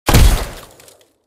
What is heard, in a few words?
Frost Shoot; Tank Stars; android